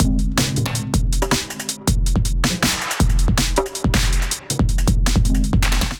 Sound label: Kit Melodic 160 bpm, Drums; MIDI Clips; Fabrik Kit; Ableton; Factory Packs; Skitter and Step